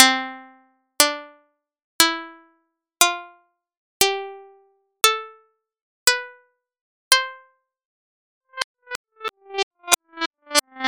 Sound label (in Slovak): c dur brnk